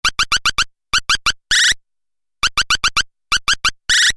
gruenfink, Vögel; midi; Polyfonisk musik; siemens

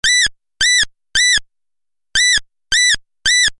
rohrammer, Vögel; midi; Polyfonisk musik; siemens

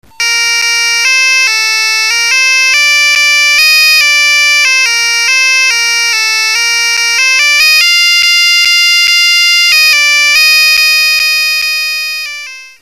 grossbritannien siemens; midi; Polyfonisk musik; Hymnen

